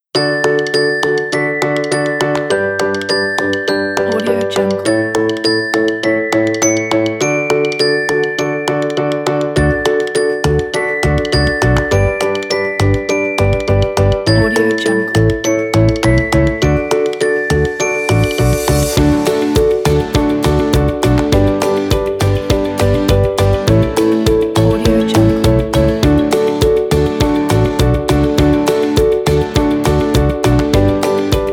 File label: happy children day; music finder